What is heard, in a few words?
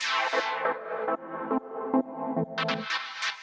TECHNO 140; Techno2